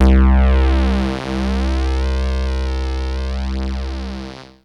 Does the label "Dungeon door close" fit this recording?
No